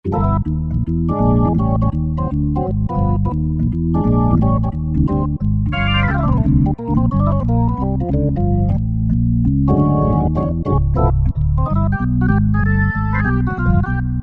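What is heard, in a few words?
organ Jazz, Yamaha TG500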